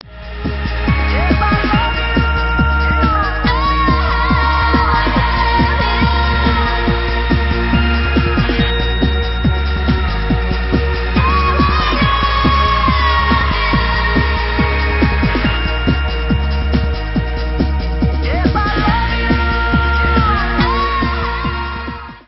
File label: techno mix